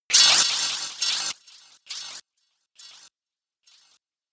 metal hit crazy

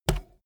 bmx bike tire foot scuff short heavy

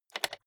rotary control short turn quick soft

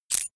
click

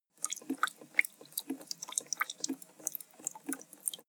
dribble small

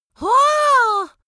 amazed boy

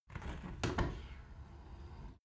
window open tilt soft sequence

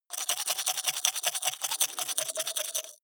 teeth brush dry open inward fast

